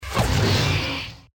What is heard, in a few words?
arrow hits basilisk; baldursgate